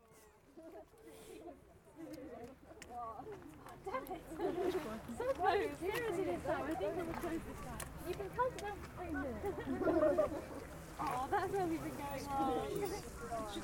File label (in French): Islande Strokkur Geysir